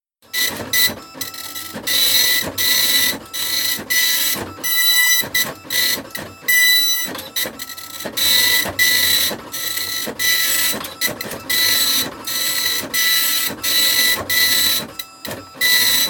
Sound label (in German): drucken